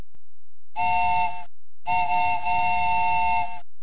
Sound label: 2 tone whistle sound